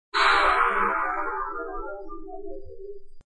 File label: Shark; bounce crusher